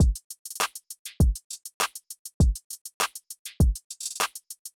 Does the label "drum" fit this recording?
Yes